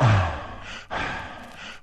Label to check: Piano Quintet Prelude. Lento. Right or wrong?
Wrong